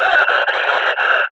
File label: Enemy Death; Warframe; Lasrian; Battle Barks